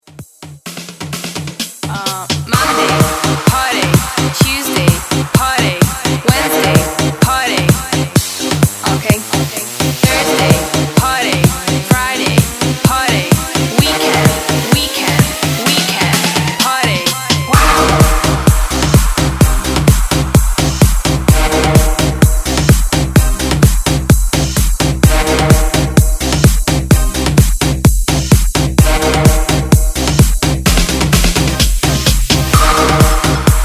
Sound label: Dance Redrum